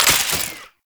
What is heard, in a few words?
Belt Fed Rifle Mech Stop; Warframe; Tenno; Frumentarius